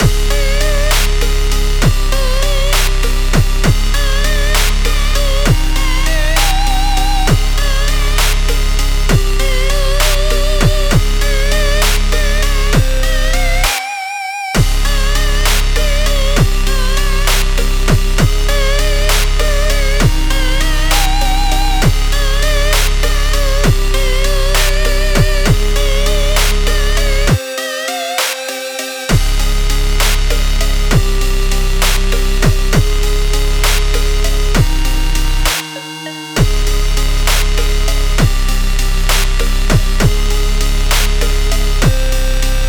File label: Chiptune; Chiptune Music Pack Vol. 4